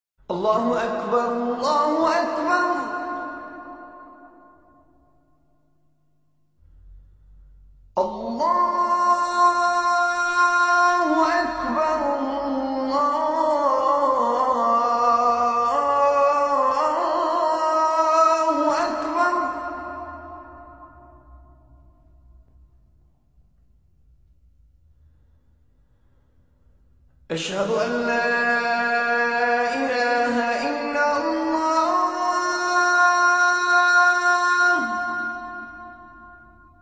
azan

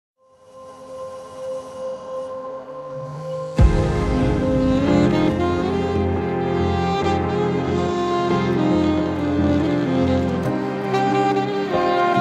saxophone

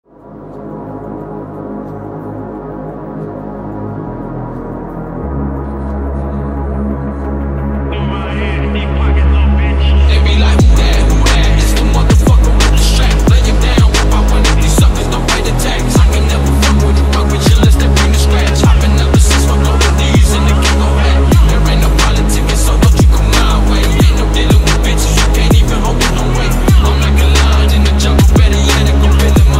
wave phonk

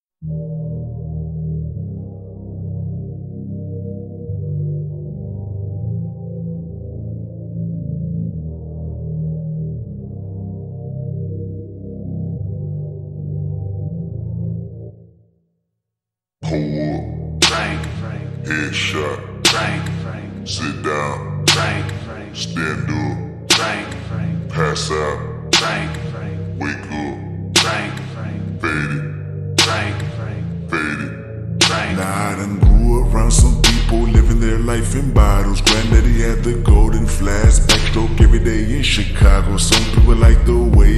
slowed reverb

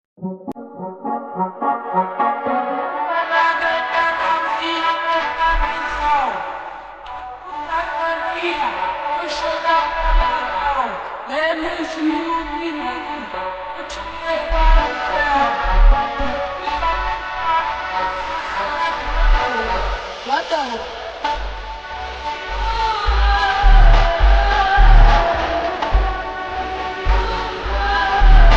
brazilian funk slowed stadium version

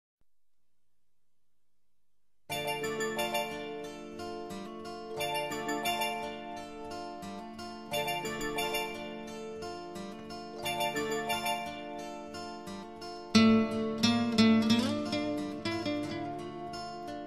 instrumental

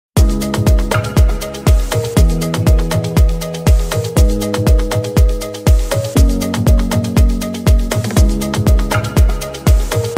afro house

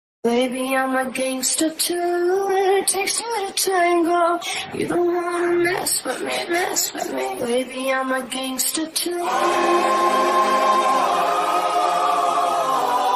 trap mix

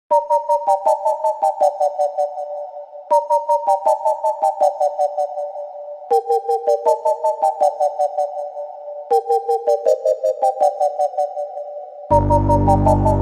psy trance remix

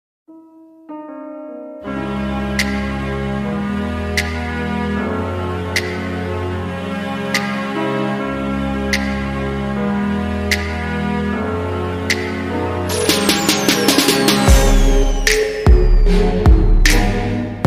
slowed reverbed bass boosted lofi mix

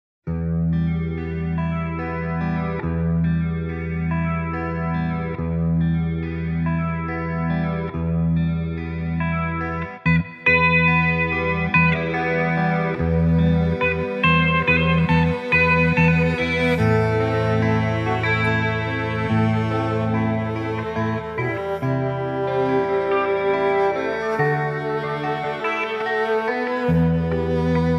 violin guitar

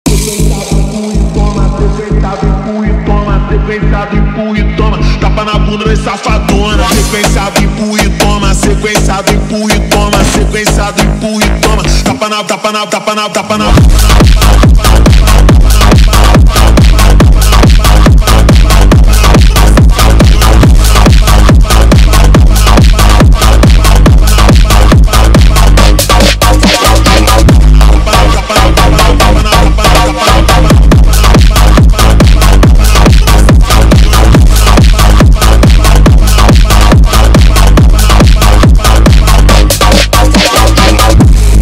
brazilian phonk

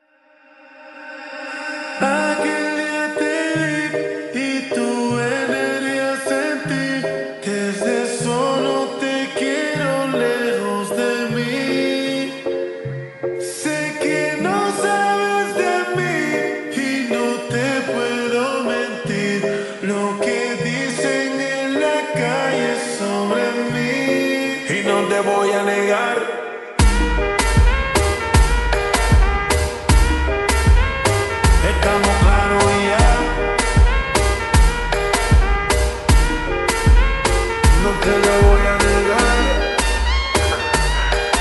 slowed reverb 8D

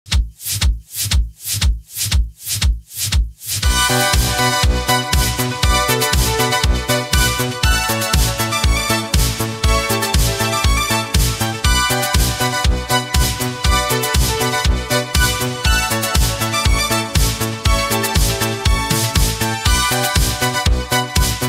80s disco, style

